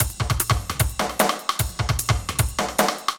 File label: RIM LOOP, 150; Masterbits - Tekkno Trax; D LOOPS